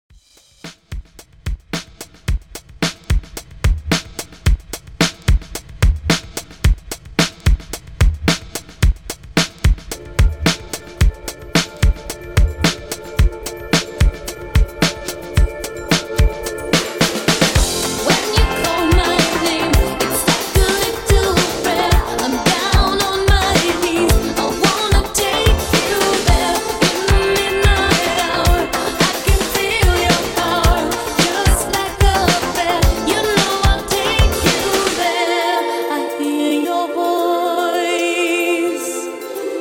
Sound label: 80s Redrum 110 Bpm Clean